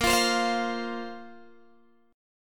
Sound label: A sharp Suspended 2nd down Guitar Open G